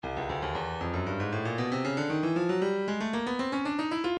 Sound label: breakbeat midi 116 bpm; Tikaboom E; Stylus Breakbeat Midi Files